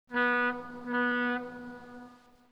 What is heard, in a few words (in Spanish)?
bocina